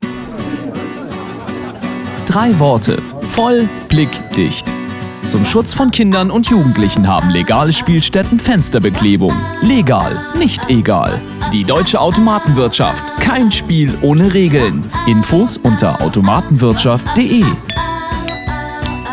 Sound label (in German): Guitarintro